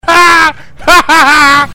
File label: HA HAHAHA